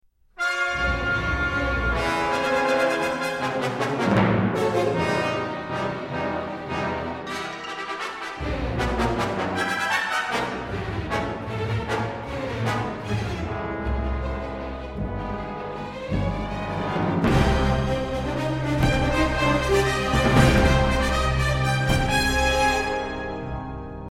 hoth combat

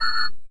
ui quest spawn friendly

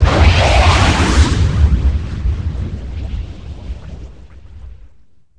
explode; tie defender

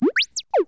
astromech confirmation

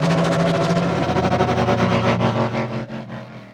sith speeder decelerate

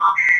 ui xwing target enemy

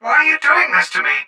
droid target droid

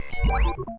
ui dialog warning

